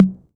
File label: 606 TOM HI, Techno Trance; E-MU Formula 4000 Series Vol. 2; OrbitPercMaster